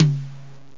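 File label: Low Mid Tom, drums; GMPlay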